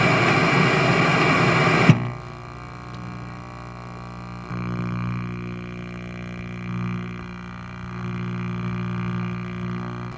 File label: static; Five Nights at Freddy's; FNAF 2